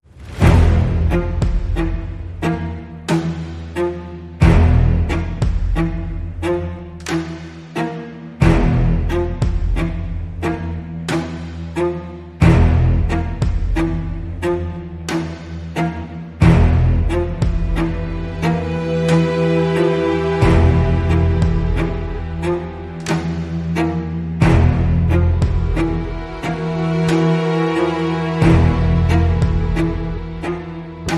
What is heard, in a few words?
Less SFX Elements